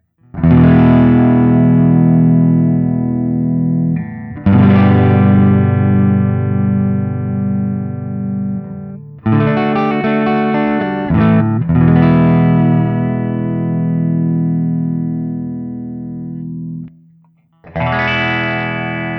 Guild Burnside Crossbow Stock ODS100 Open, Clean, Open Chords; Burnside (by Guild) Crossbow; stock configuration